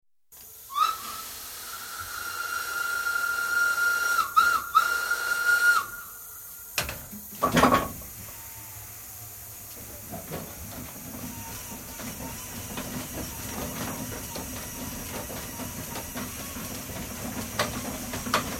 Steam Crane, starts into constant run, stops (whistle blown frequently); Machinery Sound Effects; CoalMining; BBC